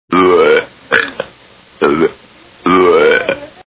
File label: belch; Beavis & Butthead; cartoons